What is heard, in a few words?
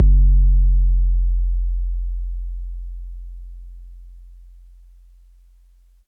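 bass drum 808 MPC60 Noise F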